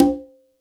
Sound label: kit, Yamaha Motif-ES8